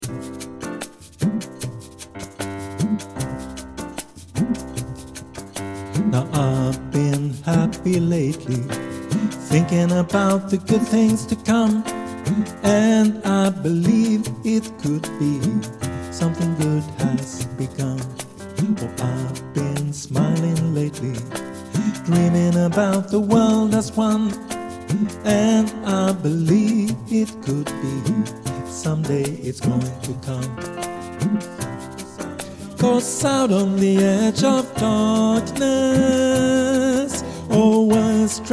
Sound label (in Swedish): sopran